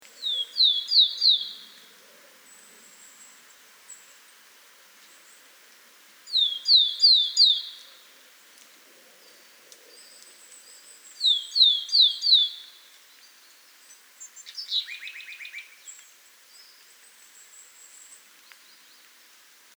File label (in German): Weidenmeise; Innernzell FRG; Michle Pron